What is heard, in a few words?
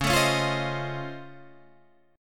Db Augmented Major 9th, Guitar, Open D